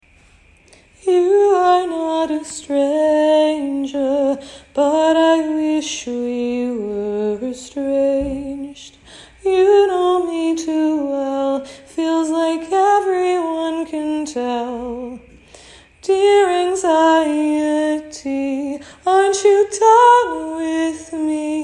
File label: rnb 100 Ebm, Acapellas